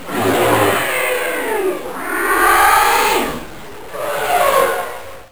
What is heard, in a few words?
Mastodon Roar; Saban